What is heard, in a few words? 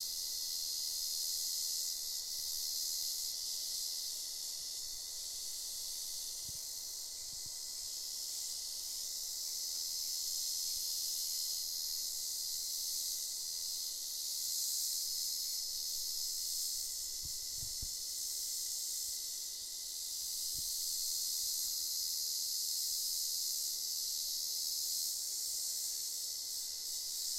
Ambient Nature Tropical